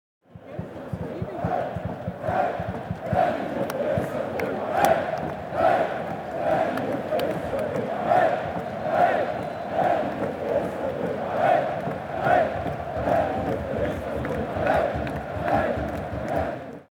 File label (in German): Stadion Fans und Gesaenge